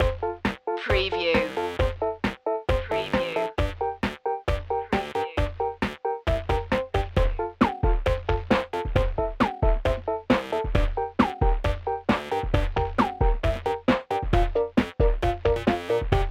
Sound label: gamer girl loop; wii